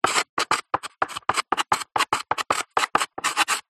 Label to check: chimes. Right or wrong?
Wrong